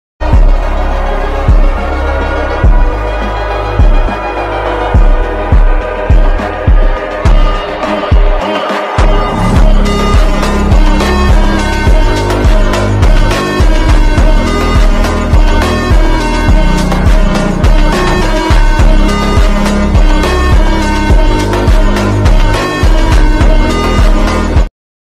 ringtone super slowed

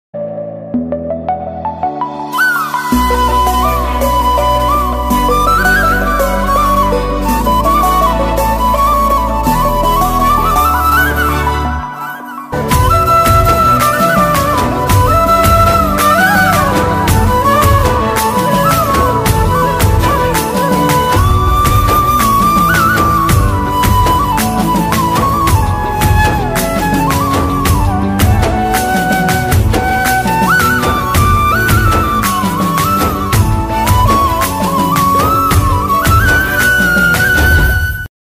odia love ringtone odia romantic

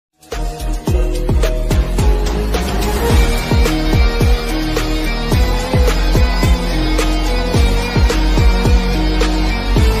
danger ringtone attitude ringtone; sigma male ringtone

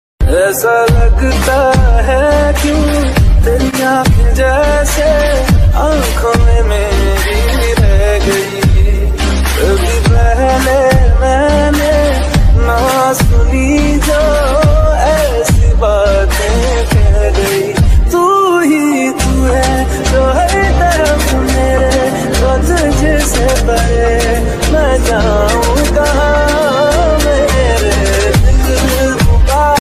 sad song ringtone

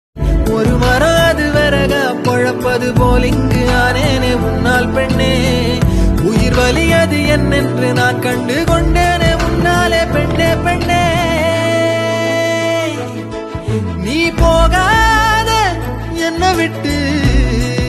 tamil song; vikram vedha

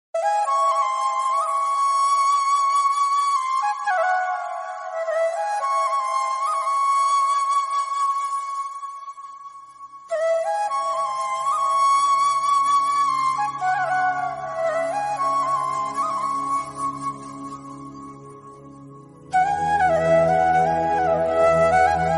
background flute music ringtones flute music

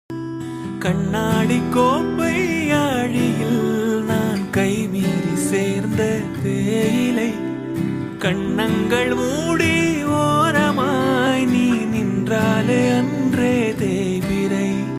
short song bgm tamilsong music lovesong

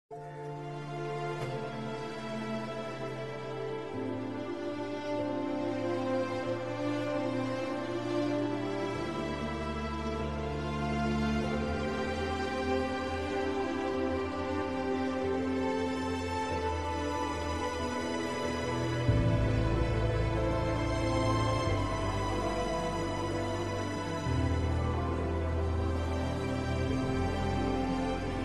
movie emotional bgm ringtone; manjummel boys

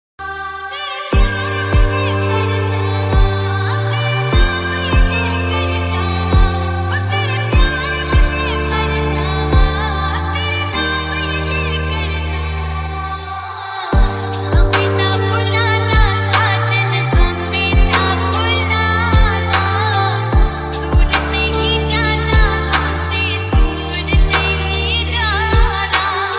ringtone

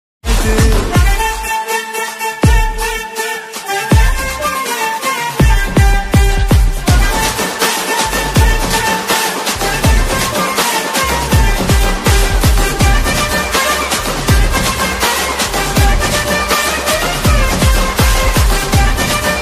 bgm ringtone

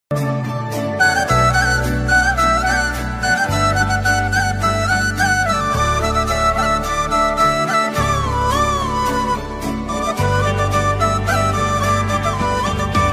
flute ringtone; snaptune ringtones

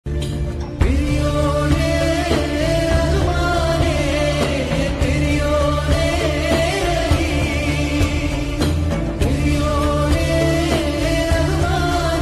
heart touching music Telugu; the goat life

